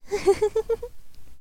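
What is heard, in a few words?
woman laugh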